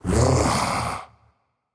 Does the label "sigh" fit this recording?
Yes